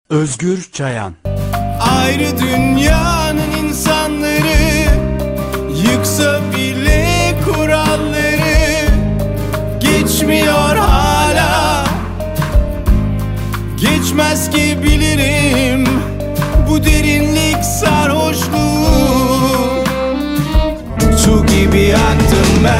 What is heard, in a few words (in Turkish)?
vokal